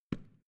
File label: switch small turn on hard